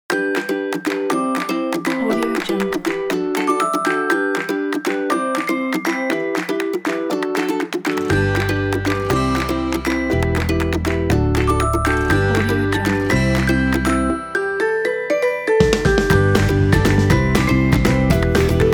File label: happy